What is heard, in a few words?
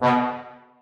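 trombones staccato a#3; Sonatina Symphonic Orchestra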